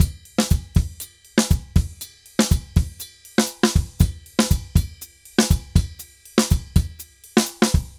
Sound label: Drums Bomba 120, 120 bpm; Drums & Percussion; Best Service; Festa Latina VOL-1